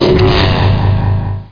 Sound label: BLAST; Hexen II; raven